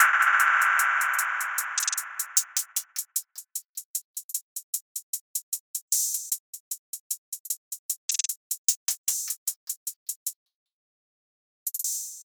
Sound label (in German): hihats nachher; EQ Tipps für Beats; 5 EQ Tipps für Beats